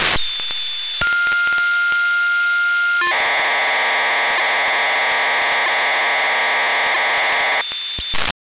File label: tisat FSK